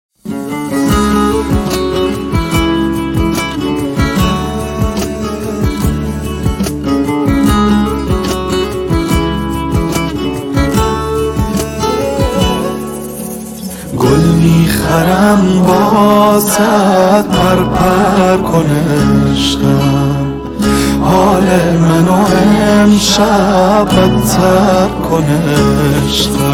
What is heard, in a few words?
guitar version